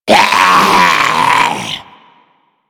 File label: Zombie Attack, Vocals; Mariner Zombie